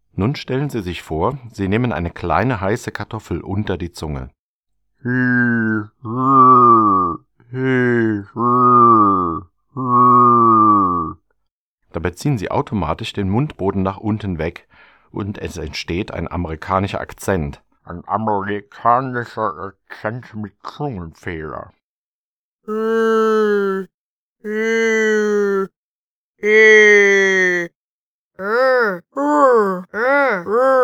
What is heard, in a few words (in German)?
L Hohlraumklang; Buch Oberton Singen CD